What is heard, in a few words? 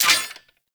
Sentient Sniper Mech; Warframe